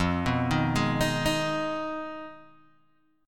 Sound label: F Minor 7th Flat 5th F down Guitar Open G